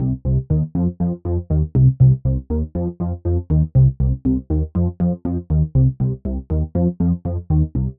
Main Melody

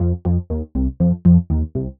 cropped half bar bassline

